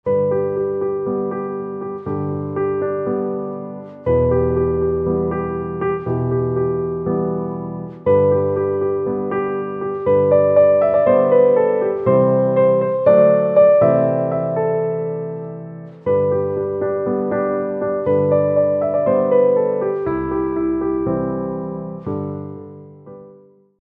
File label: Piano Silent